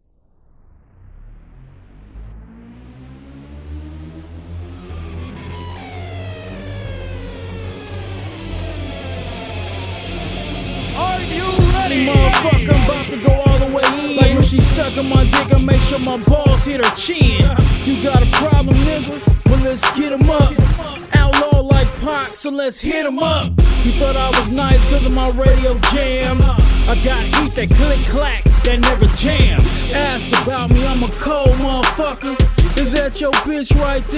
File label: 60 seconds freestyle